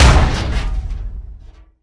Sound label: door Break; Barotrauma